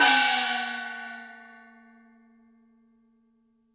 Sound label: CYMB FX; Univers Sons - Raricussions